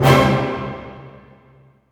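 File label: orchestra hit major; String Master Series; Dynamic Orch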